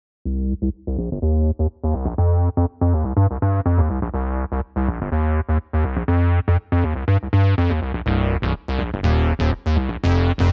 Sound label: Reso Bass